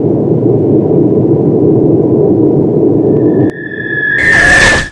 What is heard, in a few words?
shellshock; killstreak rewards